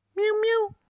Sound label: miau miau